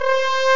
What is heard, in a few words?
french horn